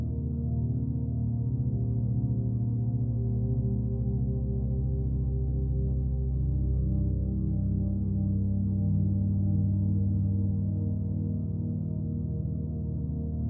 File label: Menu Drone